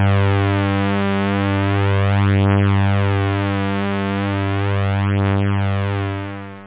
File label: synth; da capo vol1